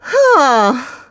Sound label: Sigh; The Lady sighing